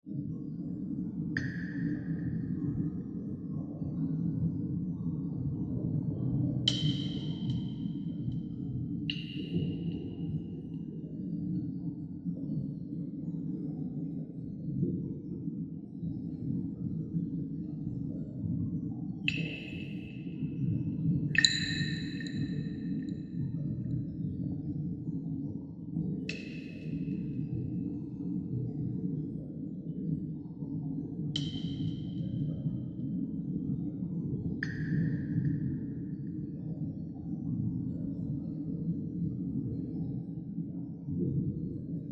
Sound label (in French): ambiance de grotte; Feed The Beast